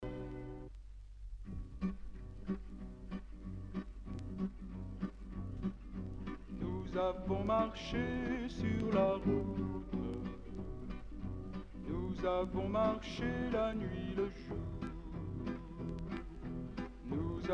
musique chanson tsigane pelerinage

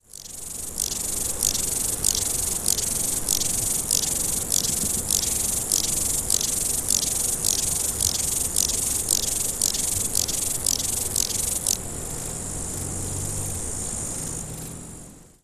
sauterelle

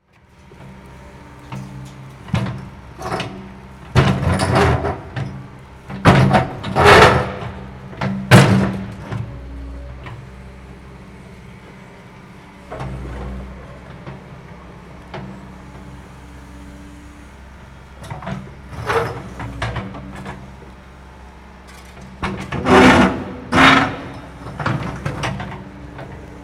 tractopelle

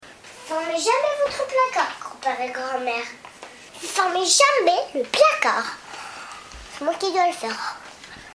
placard

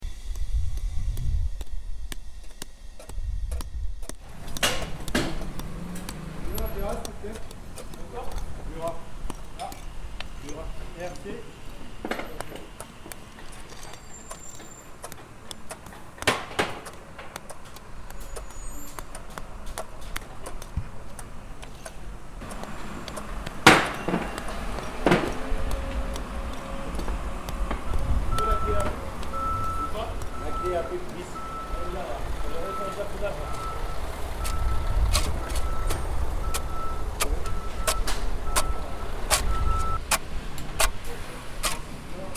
Chantier

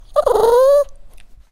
chien

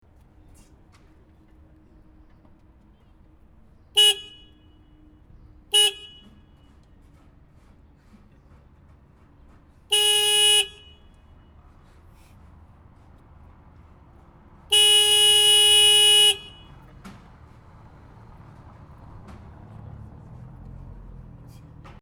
klaxon camion voirie

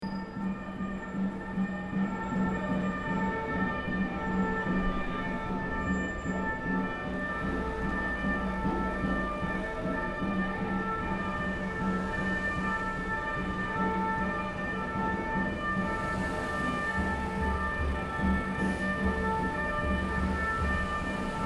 sirene police

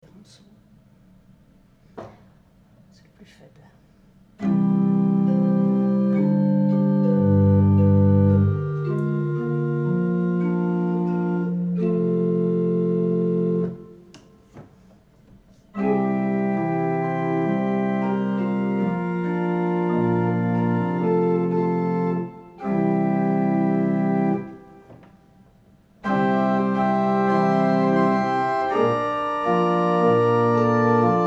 orgue eglise

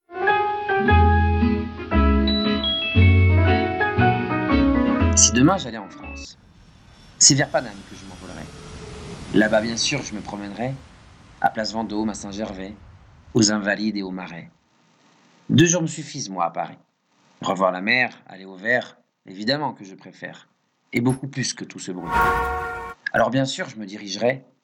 carte postale sonore

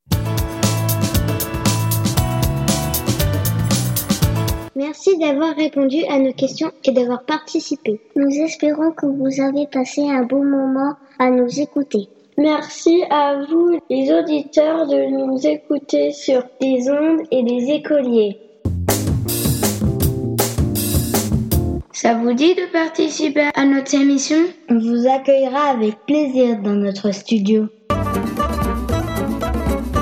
jingle

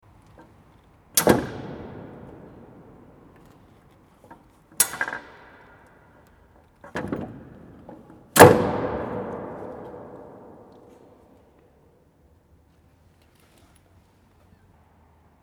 eglise banc claquement

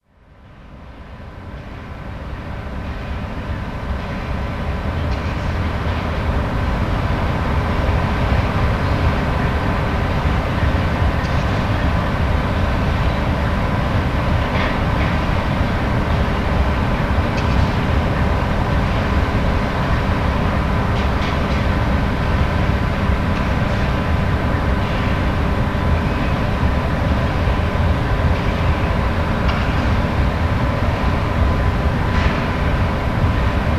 cimenterie; bouvesse

